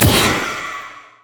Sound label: sightward ghost; LoL; items